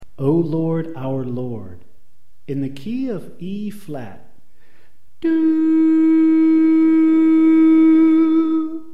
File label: Alto Left